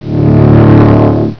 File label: pickup, shield